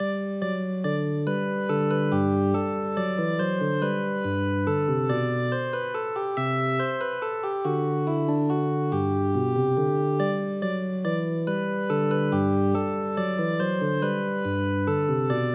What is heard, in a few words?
minuet